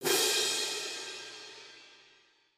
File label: landing wild; jack and beanstalk